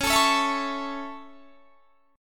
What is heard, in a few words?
C sharp Minor Sharp 5th, guitar chord; C#m#5; strummed